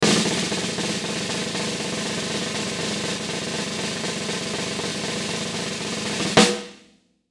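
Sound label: Musical Element, Drum Snare March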